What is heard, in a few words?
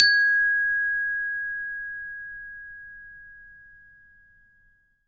ORCH BELLS A; ILIO